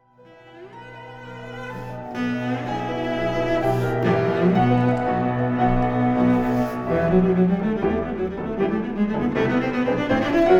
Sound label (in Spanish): sonata de cello en mi menor Allegro Finalle; Recital AECC; J.Brahms